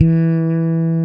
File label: Fretless Bass